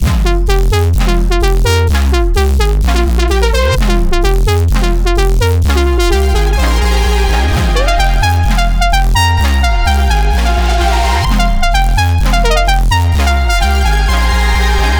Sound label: 128 BPM F Min, House, Drop Loops; Theater; Cymatics